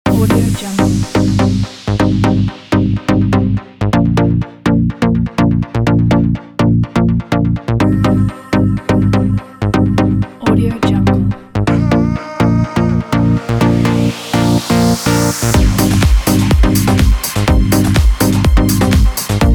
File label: progressive house pack